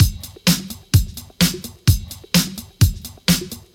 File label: House Beat, 128 BPM